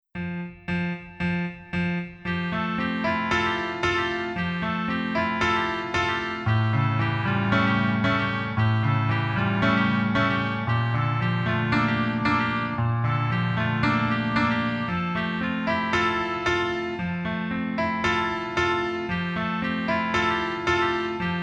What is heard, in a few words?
Piano